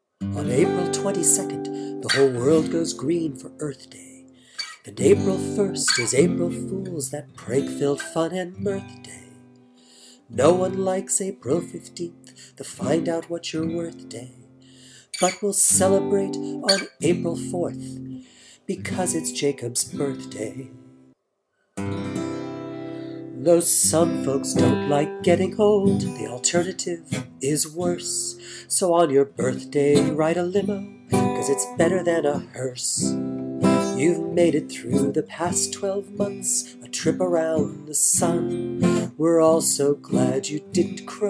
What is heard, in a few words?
Birthday Song